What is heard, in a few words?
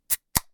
Can Opening